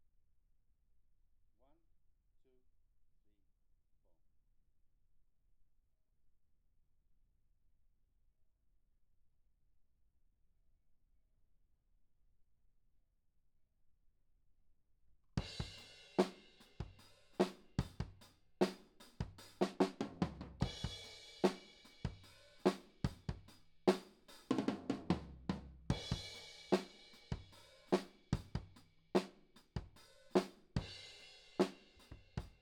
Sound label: Kit